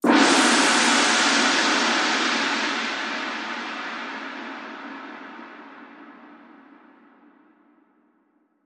Water Crash

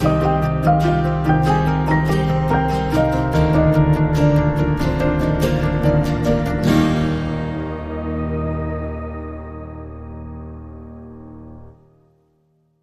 Sting

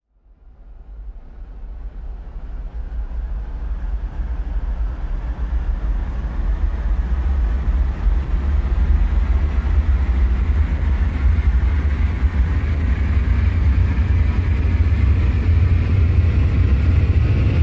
Planetary Power Up

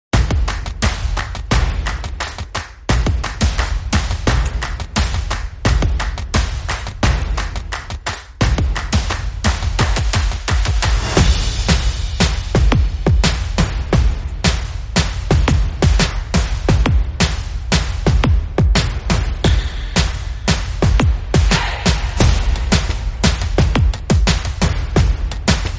Thumping Beats

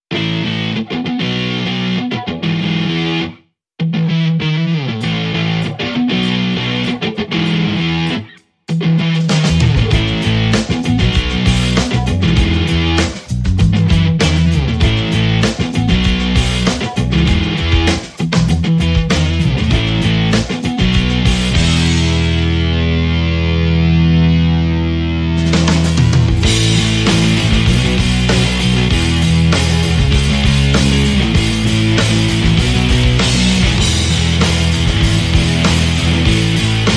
No Synth

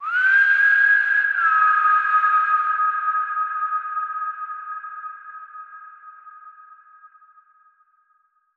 Vocals Whistle Call

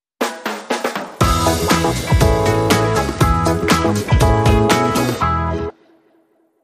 Sting

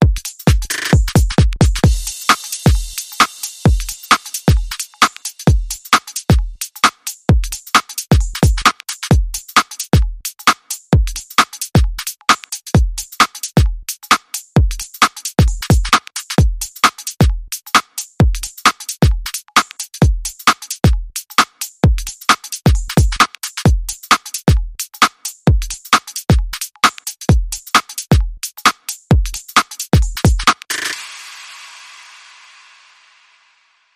Boost Your Energy Beat

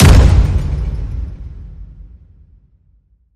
Stadium Surge Stomp

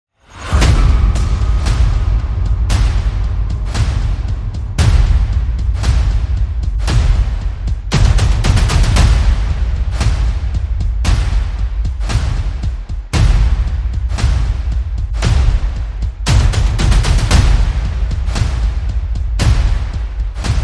Percussion and Effects

